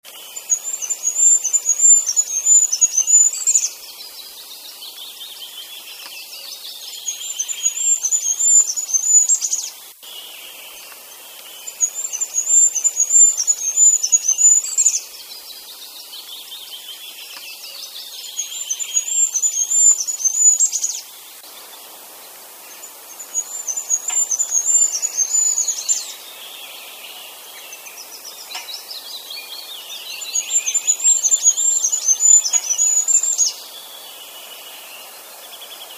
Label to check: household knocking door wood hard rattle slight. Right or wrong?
Wrong